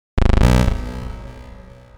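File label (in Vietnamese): Âm thanh robot lỗi hoạt động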